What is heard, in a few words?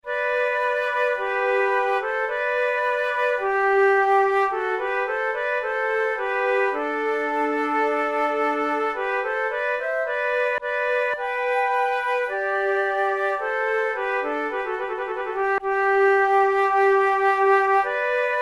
march duet, flute